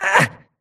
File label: grunt hits; Titanfall 2